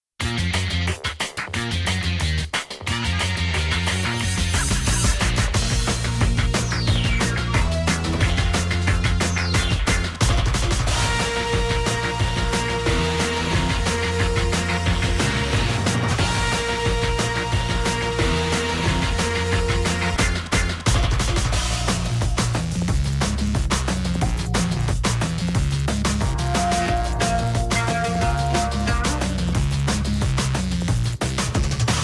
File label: Instrumental; Power Rangers Operation Overdrive